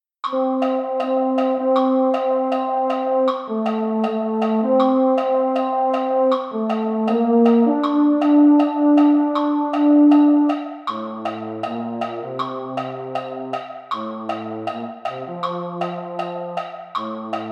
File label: Baritone; concert corps